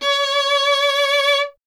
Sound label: Vln Detache, Solo Instruments; Miroslav Vitous